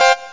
Saw Wave

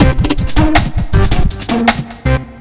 Sound Interval

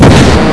flame on; PC Gamer IT CD 46; HALFLIFE; Gateway

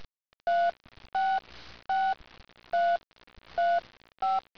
TEL DIAL; Talking E-Mail

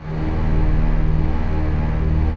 big strings eb2; Alpha Centauri

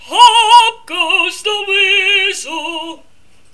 Level complete; Pop the Wheezil™ 1.0.3

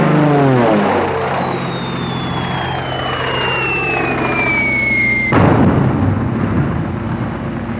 plane; plane2

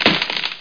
wood brk; Battle of Britain

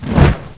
TORCH ON

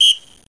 whistle

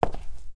tile; player; Group Mapping Project

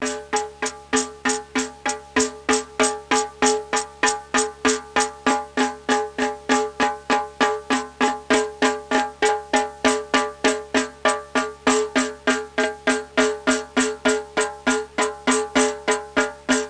tom tom; WAV Sound Effects

